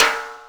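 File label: snare drum hit, roots reggae; Sounds Good - Roots Reggae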